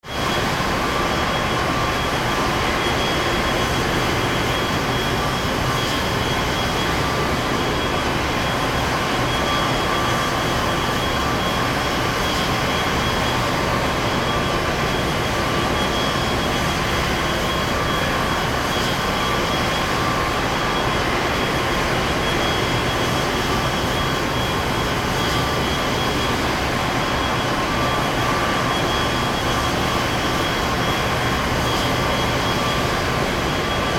continuous power

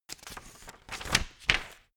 turning page

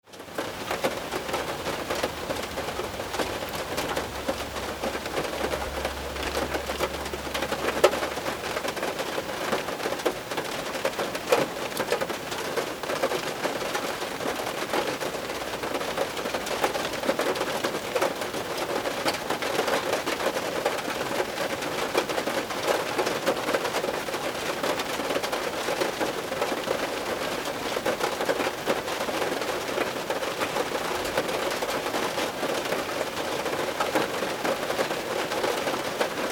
rain indoor

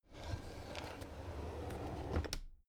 drawer cabinet crammed open soft

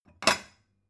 stone bowl shelve hard

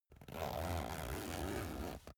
zipper use slow

